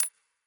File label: count Up; netent